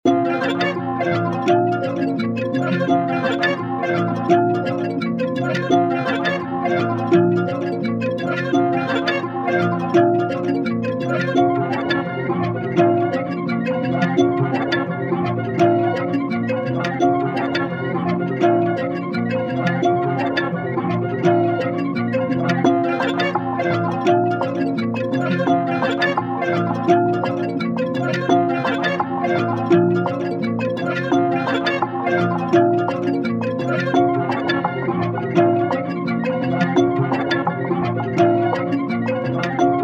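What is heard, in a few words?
A minor 170 BPM Bells Keys Leads Strings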